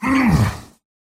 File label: pain big; Slork